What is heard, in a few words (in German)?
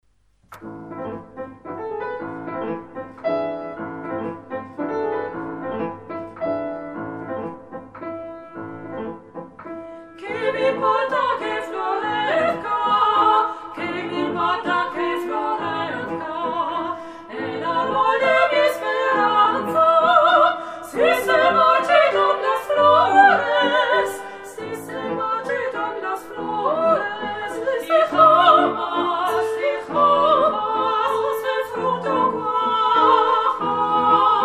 Klavier